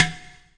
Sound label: clay pot hit